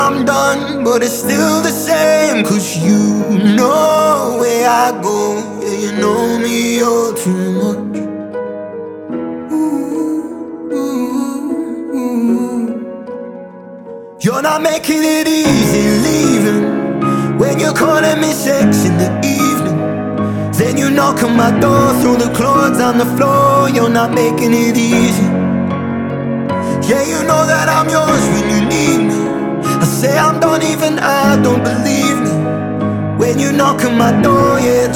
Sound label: Easy Slow Acoustic; Pop; ~ 30 сек.; Поп музыка